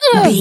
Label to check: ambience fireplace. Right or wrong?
Wrong